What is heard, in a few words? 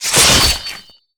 big slice; Fate Undiscovered Realms